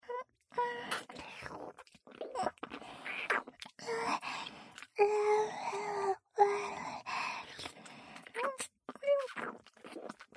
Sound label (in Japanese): フェラチオループ